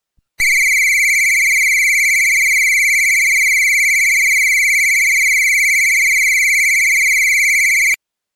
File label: whistle